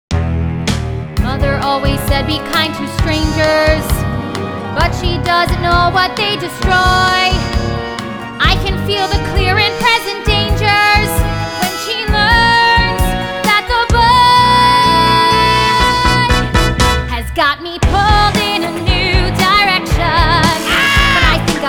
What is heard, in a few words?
guide vocal